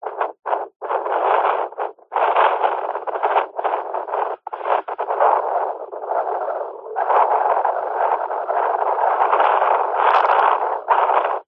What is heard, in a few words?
technology cb radio wind blowing receiver speaker pov